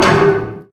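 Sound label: safety gate arrival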